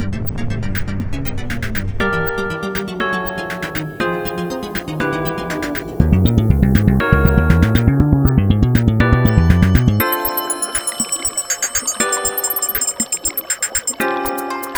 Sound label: Song karaoke version